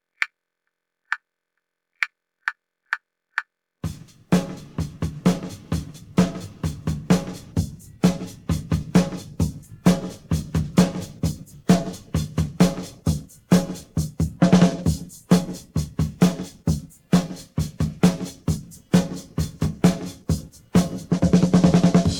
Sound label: drums pattern